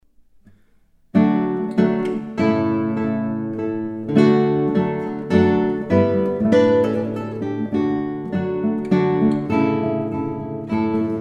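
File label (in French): Partita en ré Chaconne, 2 guitares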